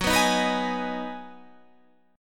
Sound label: Ab Minor 7th